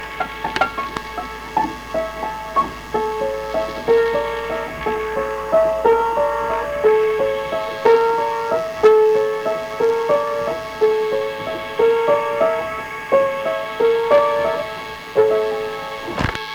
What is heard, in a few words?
waltz